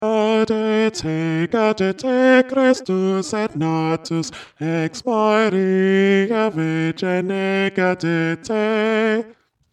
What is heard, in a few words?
Bass; Gaudete